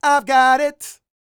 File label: SING, Vocal Planet R&B & Dance; ILIO